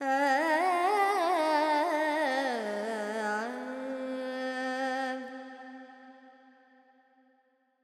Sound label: FX Purvi Kalyani, Carnatic Female Alaps; Vocals; Sample Banks